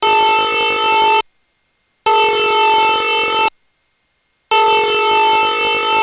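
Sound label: Emergency Alert System Alt Tone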